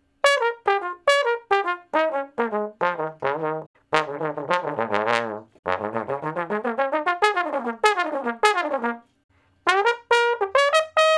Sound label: Trombone Slo Blus (Bb IV, IV, I, I); Solos